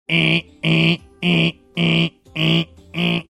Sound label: making alarm clock sound